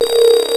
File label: PPG ringer; E-MU Formula 4000 Series Vol. 3 – Analog Odyssey; VK+ Instruments